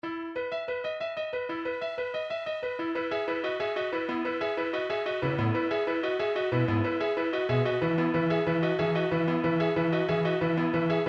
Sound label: music instrumental; nintendo wizards and warriors potion